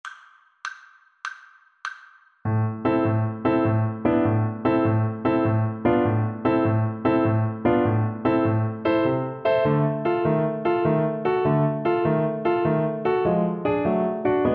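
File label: recorder karaoke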